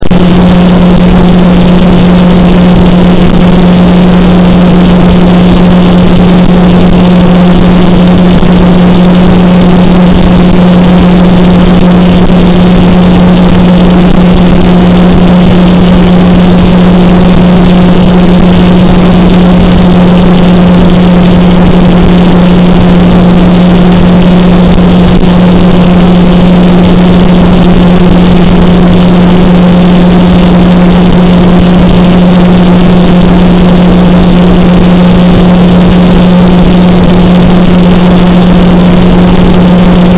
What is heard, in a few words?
Noise Relaxation